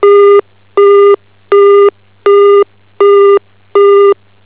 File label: busy, phone; HL1-Mecklenburg